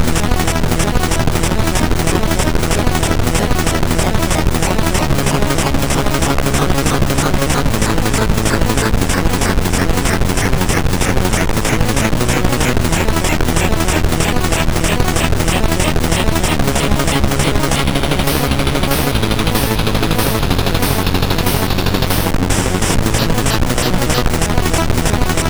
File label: Pick n Pile, MOS6581R3